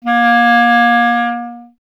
Clarinet A#0; Miroslav Vitous - Solo Instruments VOL-1

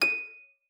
D7, HARP; Xsample VOL-8; HARP P+MF

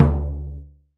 Surdo Hard, Percussion; Roland BK-5

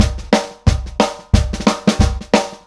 DRUM, COUNTRY; Best Service Advanced Media Trax Modern Composer

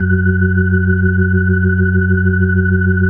F#2F, B3, Vintage Keyboards

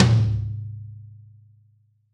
Real Tom, Roland BK-5

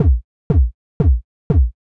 Kick, Trance, Drums

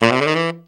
RIFF UP, TENOR SAX

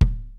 kicks snare, kid; Kid Nepro; Elite; KICKS SNARES

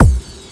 Kick, One Shot Drums; Drum & Bass

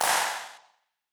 Wider Clap; stereo toolkit

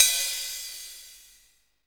hat, Hi-Hats; Roland L-CDX-01; HH Modules

